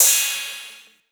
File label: Cymbal, 909; Slate; Vintage Drum Machines Volume 3 909 Edition